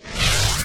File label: teleport; 100rogues